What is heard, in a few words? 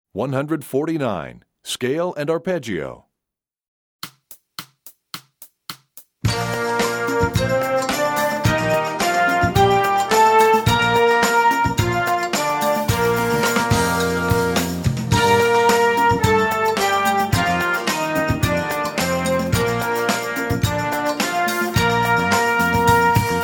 Scale And Arpeggio, Brass WW; EE2000 Book1